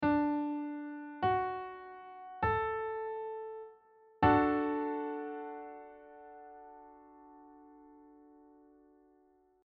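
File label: d major chord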